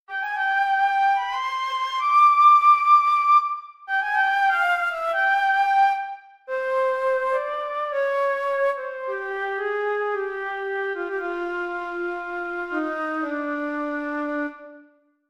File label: A Nice Flute